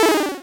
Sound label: finesse Error, chiptune; Techmino